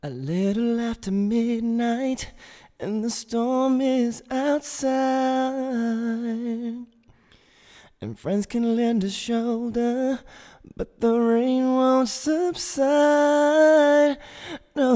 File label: SMOOTH VOCALS Unprocessed; OPTOCOMP